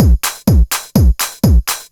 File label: loop 125bpm, House Drum Loops